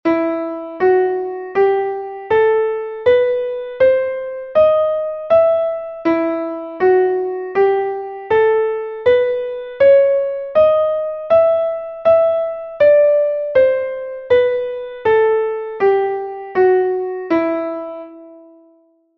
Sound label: scale of e harmonic; Essentials of Music Theory: Elementary